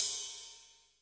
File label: CY RD, 60S KITS; 90 sSampleCDs; Crypton - DrumBase5000